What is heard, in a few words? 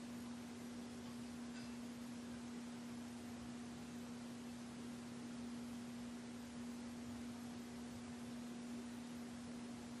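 Ballroom Static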